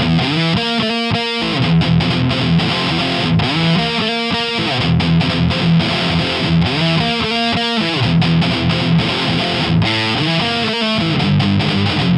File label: line6 pod xt; j-800